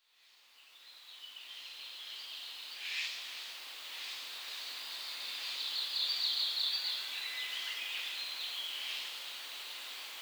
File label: bird